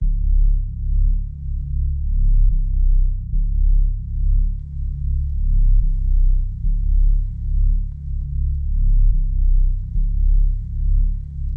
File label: 145 BPM C# Min Bass, melody loop stem; Trap; Loop Stems; Cymatics; 2022 Melody Collection; Ferrari